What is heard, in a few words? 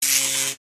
Electric voltage sparks